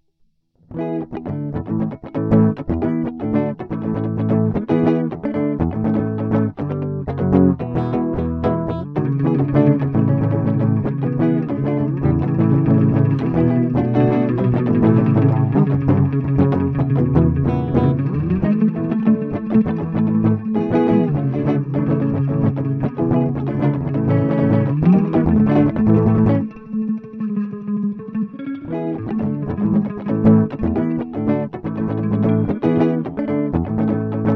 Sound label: Fast strum, fast pick solo; battle; Hylics